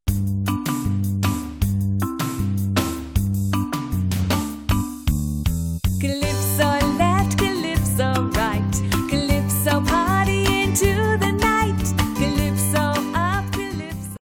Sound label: Calypso